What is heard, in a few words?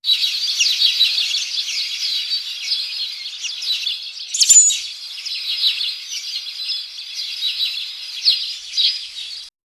birds scattering suddenly